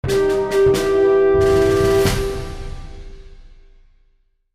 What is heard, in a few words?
defeat